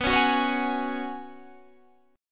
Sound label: Gb Suspended 2nd Suspended 4th B